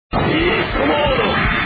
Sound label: transform